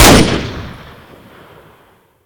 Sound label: m240, weapons; Modern Warfare